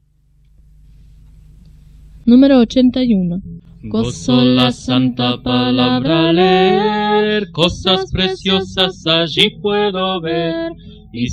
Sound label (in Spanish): Coro, himnario niños